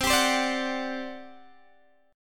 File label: G Major 7th Suspended 4th, C